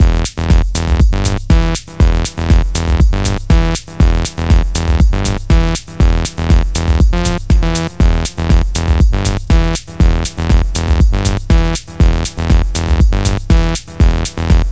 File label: SH-101 Dirt Lead